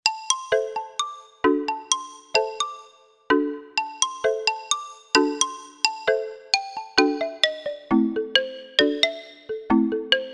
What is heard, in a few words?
Barafon, Roland SC-880; Factory Tones